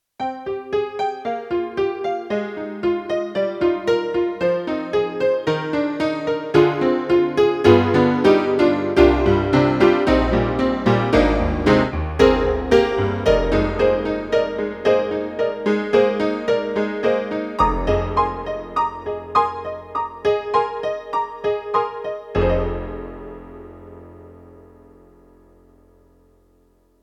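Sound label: YAMAHA MDP-5 XG Honky Tonk K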